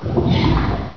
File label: ichy idle